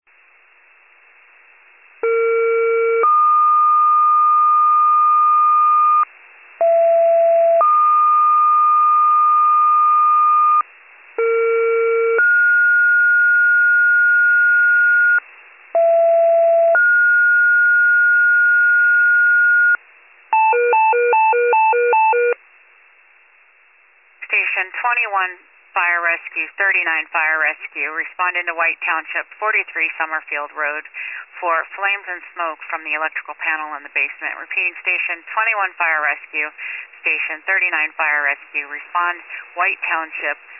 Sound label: fire, pager alert